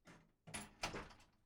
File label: Steel Door Close